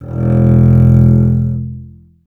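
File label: string contrabass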